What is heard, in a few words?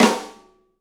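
Pop Snare Flam; Snares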